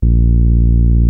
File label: micromoog